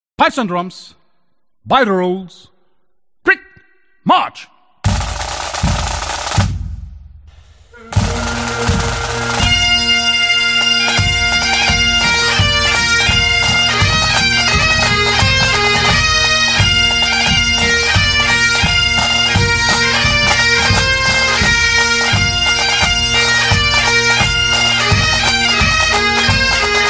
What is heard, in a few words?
Kilted Music; HIGHLANDER